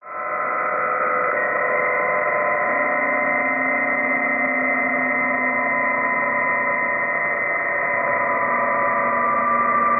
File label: doppler rate psk31; KA3WCA; PSK31 Transponder Design Page